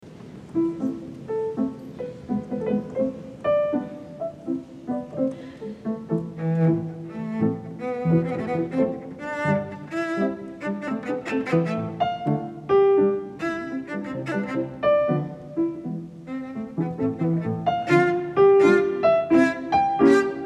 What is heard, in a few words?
Cello Sonata in A Major Op. 69 II. Scherzo. Allegro molto; Beethoven